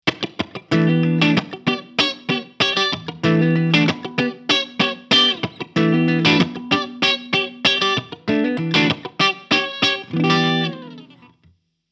Clean; Hughes Kettner BS200 Floor

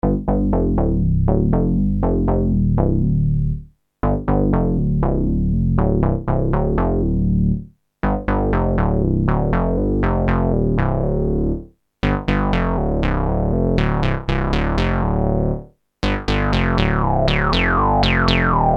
Fm Bass

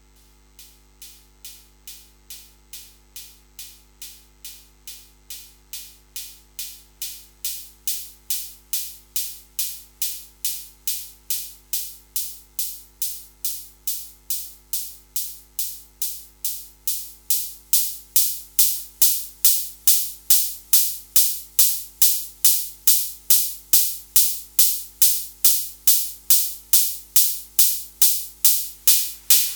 Tascam 688 MIDI Studio

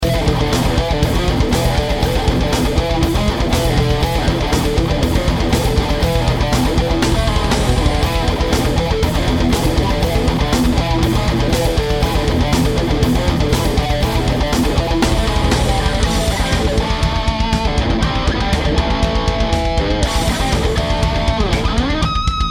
Lead

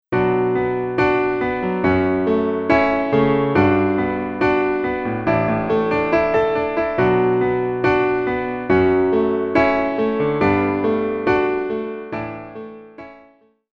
Grand Piano

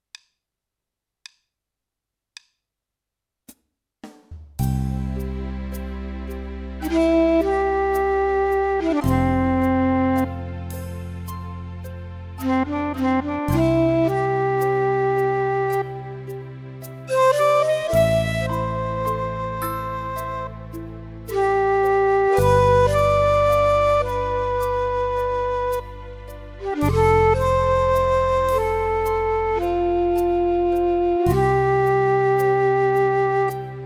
Korg Pa1000 Style

